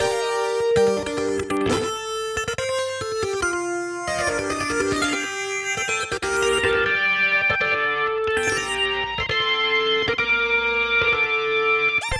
Engineering Lead; Bust n Rush

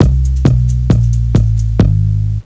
Song Lose; Girls Only; NDS

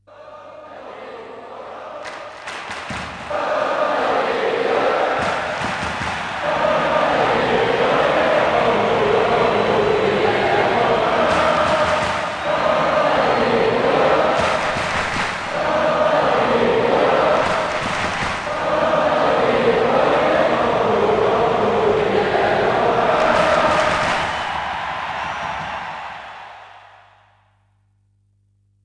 Chants - European Type

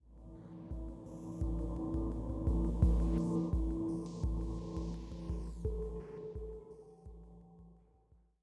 Military High 85bpm

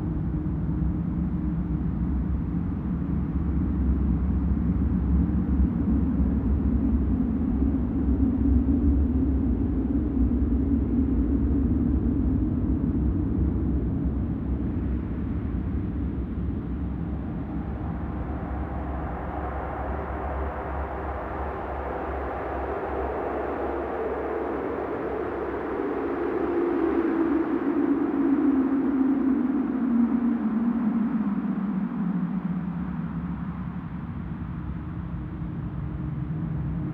Hesperus II Ambience; MechAssault 2: Lone Wolf; Xbox

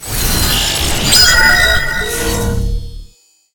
Cut in

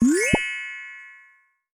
Cute Card; Yu-Gi-Oh! Master Duel

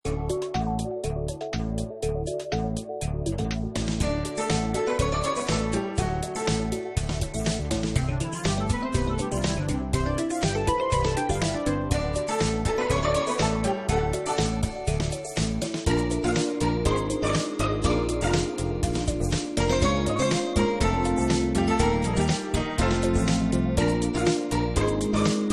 Battle Main Bgm; doraemon gadget rush android ios mobile gamerip 2015

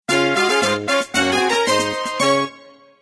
Se Result Clear; Chalk Dash Carnival

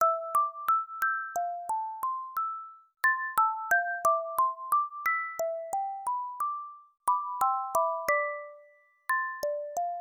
Music Box; Adventure Tsukuru 98; PC-98

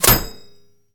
Bullet Impact Metal Light; jasem just another shooter with electronic music windows 2017

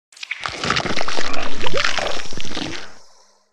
Cockleshell Open; we are the dwarves gamerip 2016

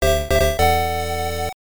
Super Win; Super Medal Fighters; arcade

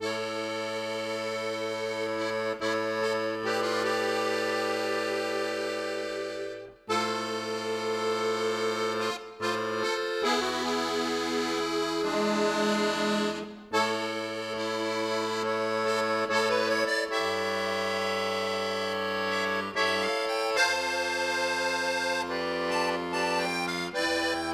Accordion; legend of zelda the breath of the wild the champions ballad; Hero Sword

